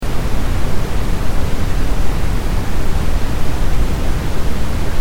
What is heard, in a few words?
brown noise; testsignalen